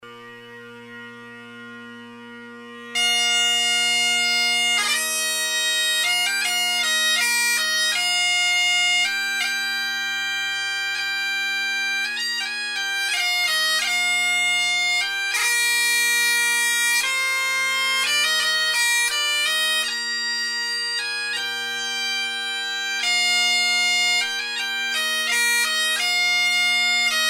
bagpipes

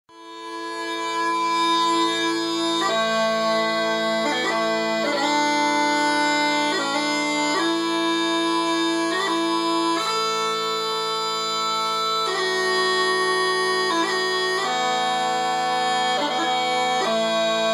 smallpipes; Bagpipes; Skye Boat Song

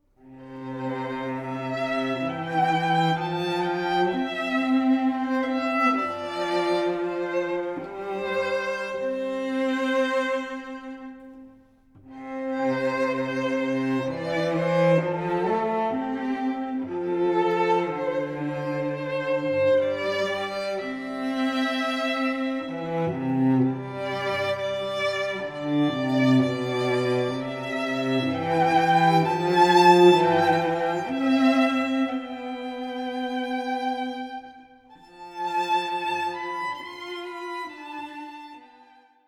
violin-cello duo; string quartet; Be Thou My Vision

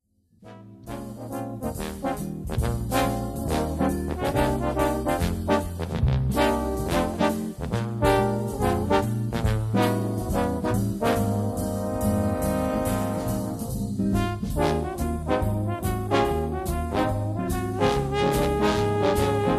Jazz Bones; Jazz Band; Premier Entertainment

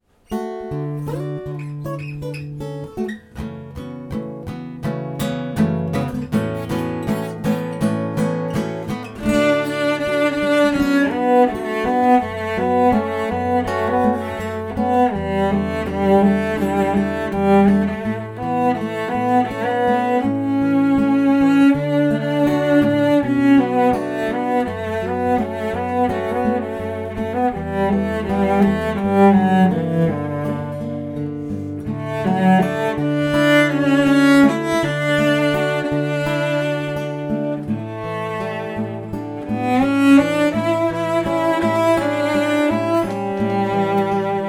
Cello Guitar Duo

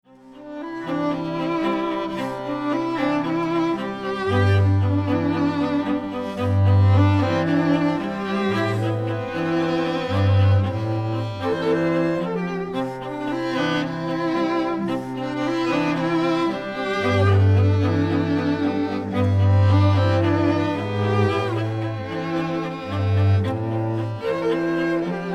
String Quartet

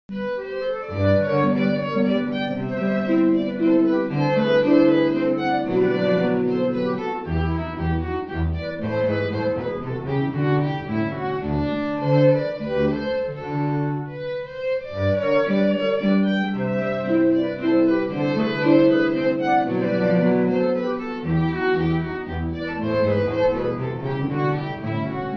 Waltz, String Quartet; Dennis Wilson

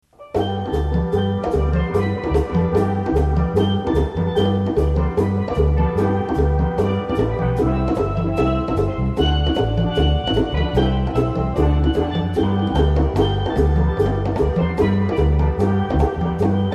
Pan Band

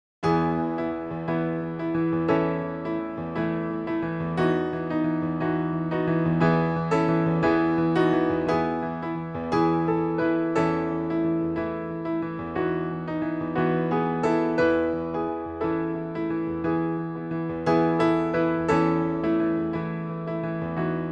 rock piano wedding recessional; City of God

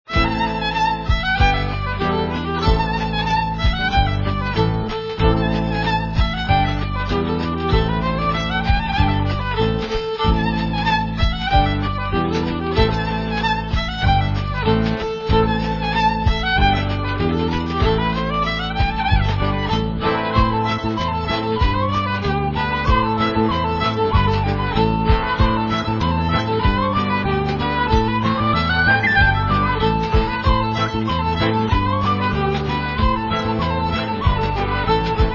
Celtic set, acoustic band

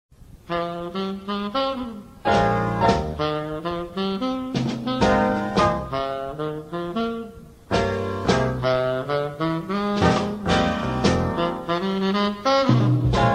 Quartet, Jazz Band

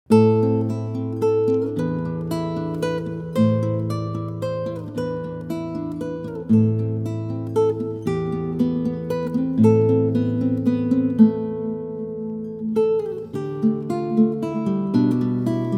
A French Lullaby, Classical Spanish Guitarist

